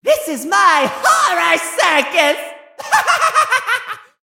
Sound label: Laugh; don t be afraid the first toy; This Is My Horror Circus